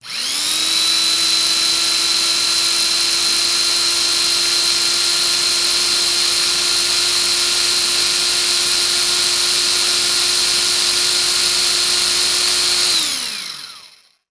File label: Industry Tool Saw Electric Saw Long